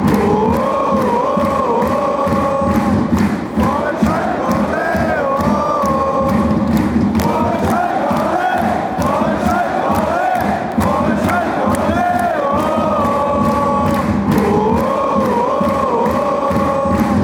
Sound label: ole schalke allez